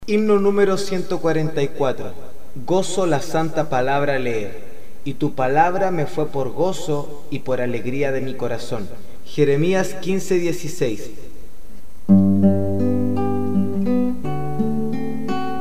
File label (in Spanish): Gozo la santa palabra leer melodia palabras